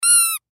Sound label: Squeak Toy Small, Squeak Squeeze Single Beep; Objects; Blastwave; Foley